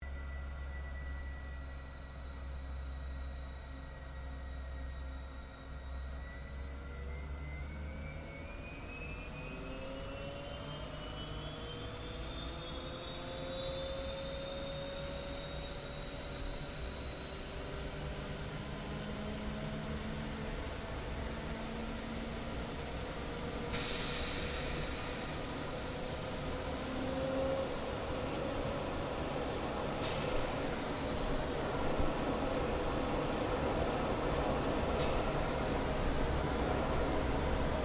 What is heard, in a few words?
AIRCRAFT GLOSTER METEOR F MK8 TAKEOFF INTERIOR, Gloster Meteor fighter jet; Aircraft 1947 Gloster Meteor F Mk8; Airplanes